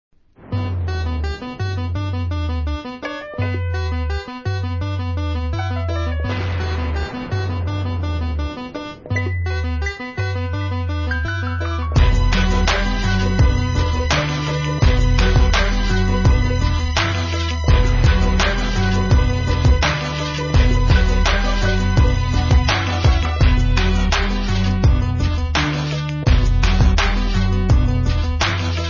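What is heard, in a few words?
Instrumental, ringtone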